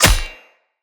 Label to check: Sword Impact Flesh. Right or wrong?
Right